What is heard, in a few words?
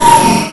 Health